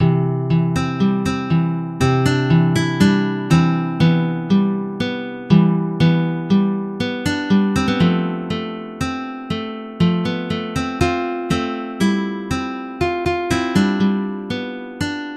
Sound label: gut guitar; rurouni kenshin; himura kenshin